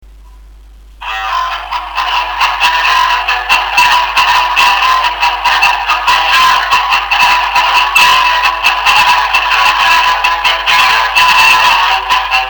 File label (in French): violon